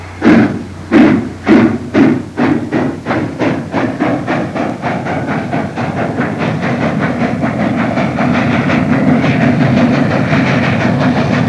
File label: OLD LOCO, treni; trasporti